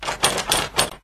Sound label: door; misao